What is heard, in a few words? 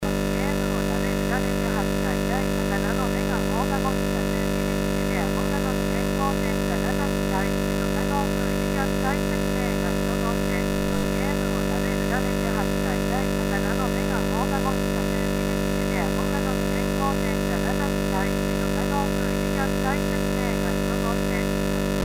sensei bgm